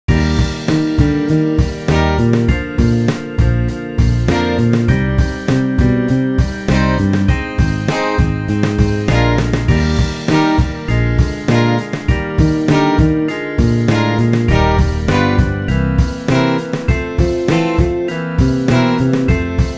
Eb Flying Fingers, brass

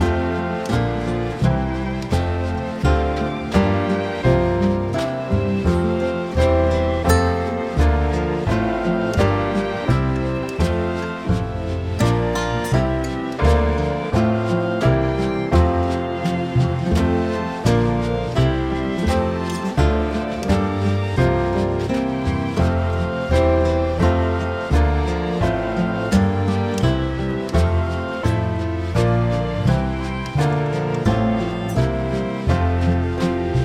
Eb D Major Scale

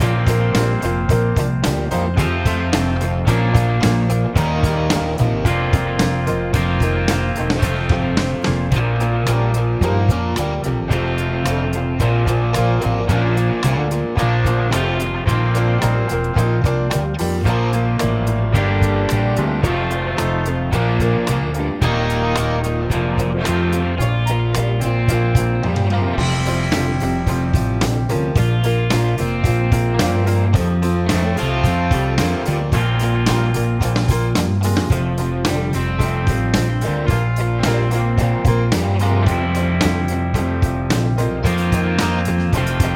Eb Push n Pull, brass

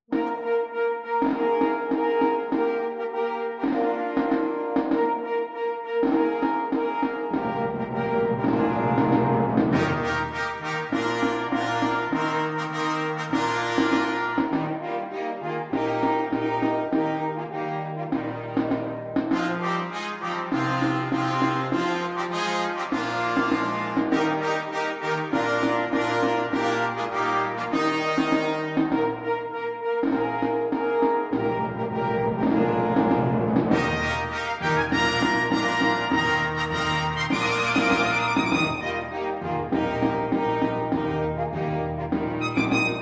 Eb Bouncing Quavers, brass

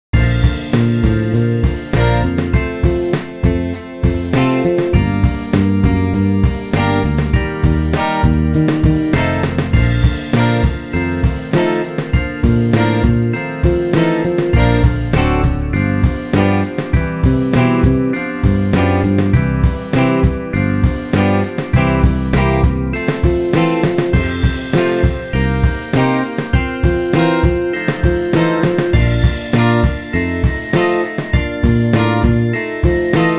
Bb Flying Fingers

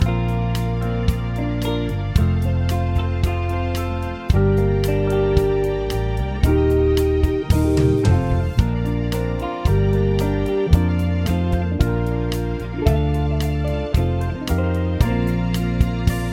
Bb C Major Arpeggio; brass

